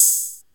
80s focused open high hat sample b key, Royality free open hat one shot tuned to th